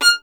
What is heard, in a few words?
STR VLN, Solo Instruments; Miroslav Vitous